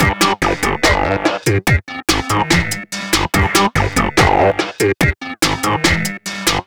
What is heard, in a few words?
Crunch w Syn, Keyboards; Sonic Foundry; Groove Elements